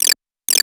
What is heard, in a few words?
guiro, notifications; Nothing Phone (1)